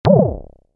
Space fx pickup, Spaceship Environment Style Pickup, Short; Video Games; Video Game Sound Effects; Video Games (Sound Effects:Games); Stuart Duffield